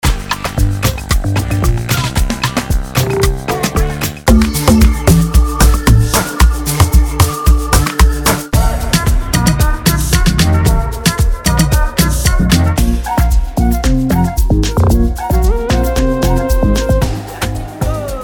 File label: Amapiano; Fantastic Lab; Amapiano Balcony Bundle